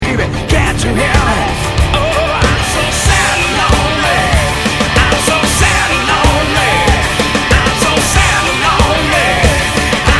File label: Sad and Lonely